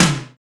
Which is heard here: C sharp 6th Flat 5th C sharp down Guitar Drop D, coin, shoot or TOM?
TOM